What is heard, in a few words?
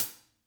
HAT; Sonic Reality - Vintage Timetraveler